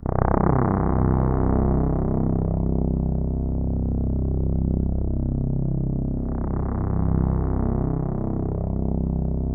C1, Synth Line; 90 s Sample CDs; NiceBeats; SynthLine VOL-24

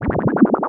Wibble, Synth FX, cartoon; musicradar; cartoon caper

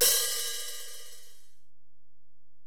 HiHat, Alesis DM-PRO

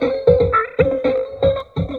Vocoded Percussion; Vocal; Sonic Foundry (Sony Creative Software); Myths of Technology

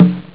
bongo; ST-XX Amiga Soundtracker Sample Packs

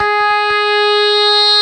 LEAD; jazz jackrabbit 2; M8

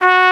Hard Trumpet F#3; Proteus 2000; Digital Sound Factory Proteus 2000

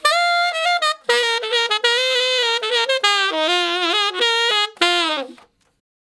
Ten Sax Straight (F), R&B; Crimson Blue and Fabulous Horncraft 4 RnB; Solos

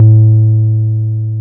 303 MUTED A2, ROLAND TB303; Big Fish Audio; First Call Vintage Keyboards

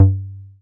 303, TB-303 BASS

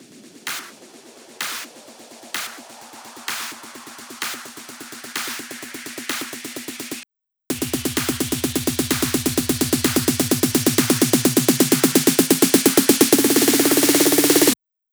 Drum Loop Transition Loop, 128BPM; VEC5 Drumloops; VEC5 Multi Loops 128BPM; Loop Elements

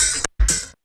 LOOPS

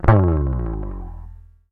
SLIDE, Bass; Sampleheads; Will Lee Bass Library

